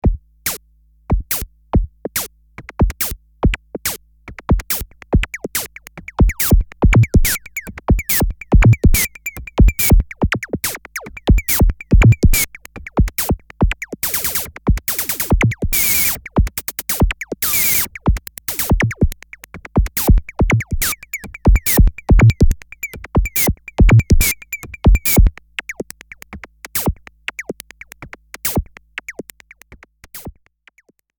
monologue

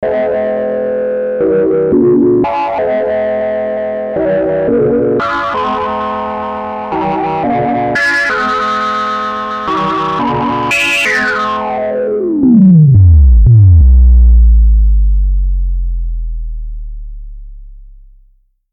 monologue

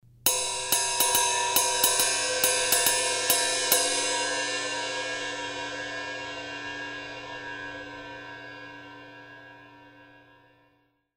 ride pattern

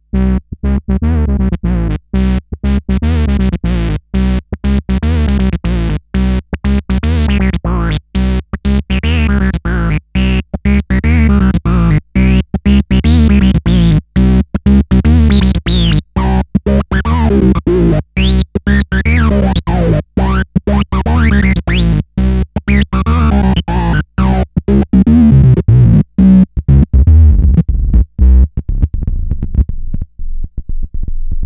monologue

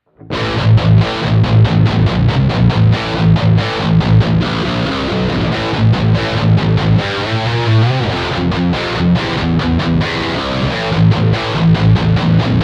lead

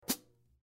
chick

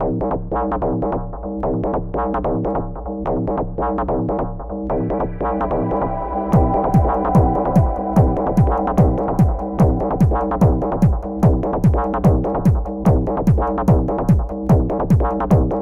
microfreak

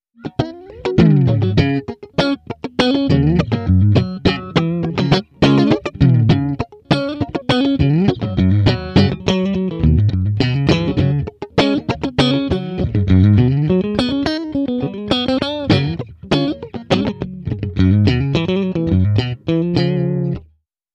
clean st

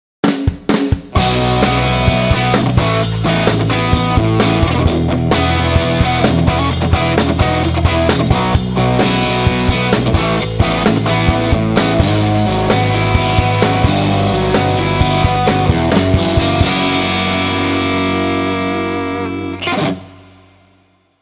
triamp mkiii

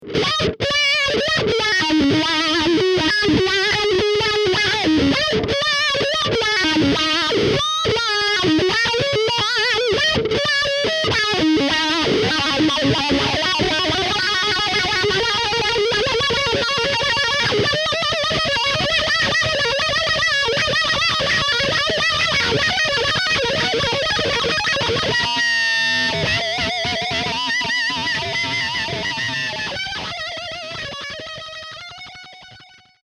wah wah

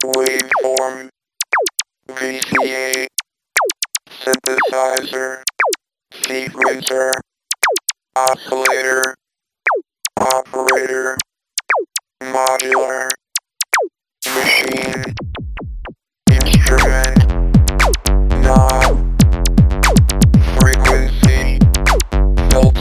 microfreak

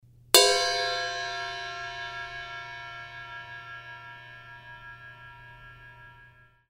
bell

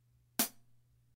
closed hit

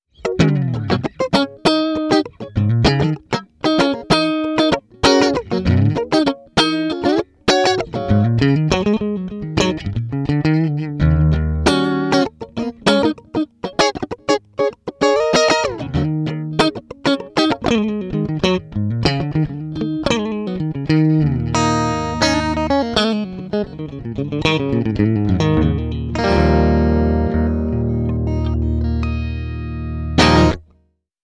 clean st

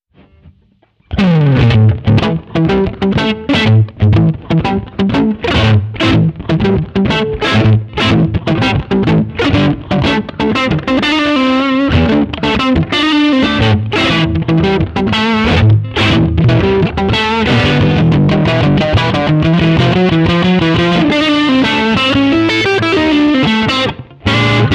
blues rev

